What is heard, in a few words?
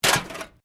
Can Softdrink Rubbish; various metal cans; Materials